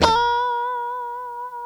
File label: C 5 FUNK SLD, GUITARS, FUNK STRAT; Spectrasonics; Hans Zimmer Guitars VOL-1